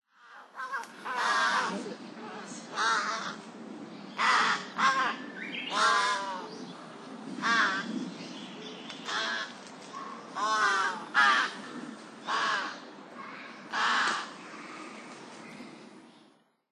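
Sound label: Large-billed Crow; utsunomiya; koe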